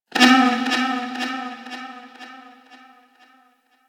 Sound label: Ship Energy Bounce